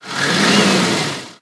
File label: Vehicle Engine; blue archive